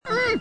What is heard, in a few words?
hmnn; Dragon's Lair